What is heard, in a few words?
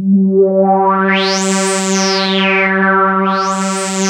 ARP 2600 G3, synthesizer; Hollywood Edge - Giorgio Moroder Rare Synthesizer Collection